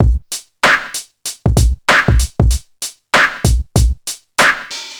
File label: 96 bpm drum groove A sharp key